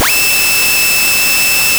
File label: ray